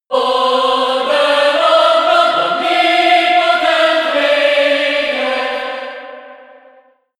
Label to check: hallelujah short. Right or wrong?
Right